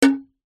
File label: foley tin small metal empty impact hit; zapsplat